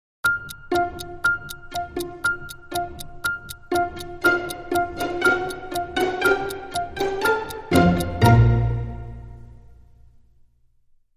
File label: Dramedy Sting